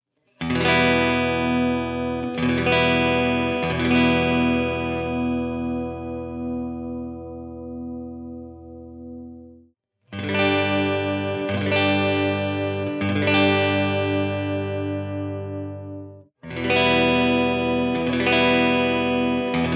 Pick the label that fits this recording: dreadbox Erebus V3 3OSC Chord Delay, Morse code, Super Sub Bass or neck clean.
neck clean